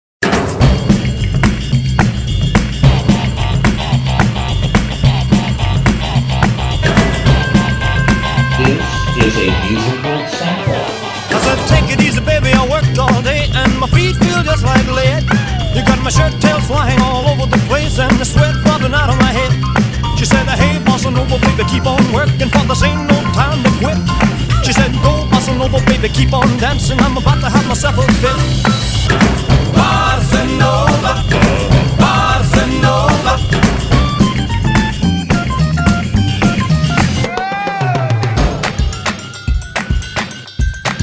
Bossa Nova sound alike; sound alike tracks

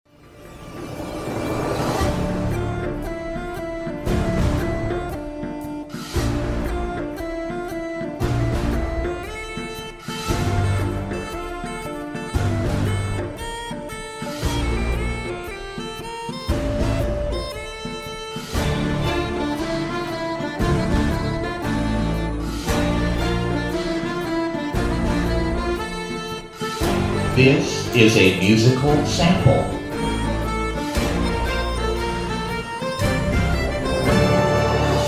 Irish Dance; Sound Alike Tracks

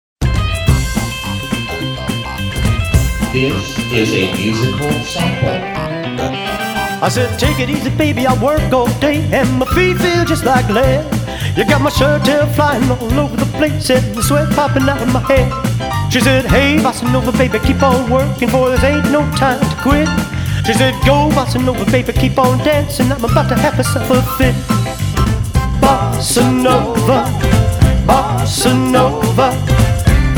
Bossa Nova

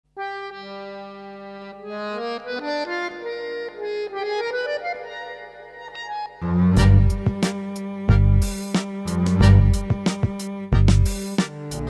Tango Damor DANCE

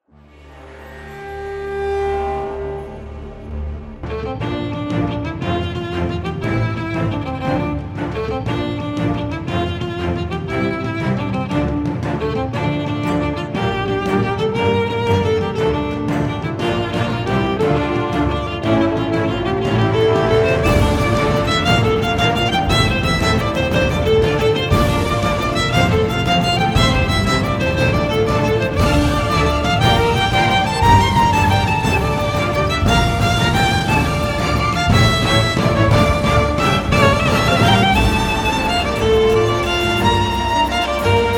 VIOLIN

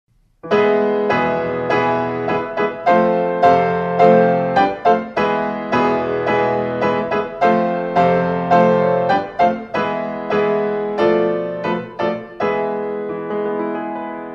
PIANO

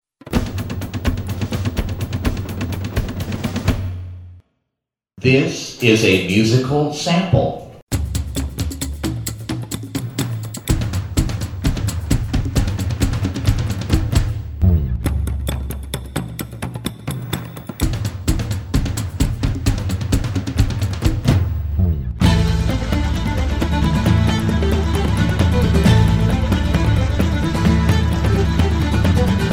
Irish Dance; Sound Alike Tracks; Riverdance

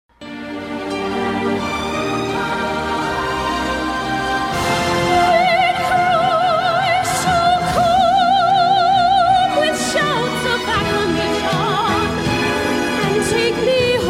Soprano

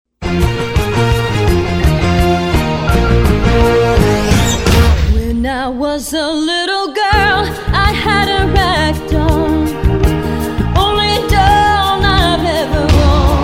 Jazz

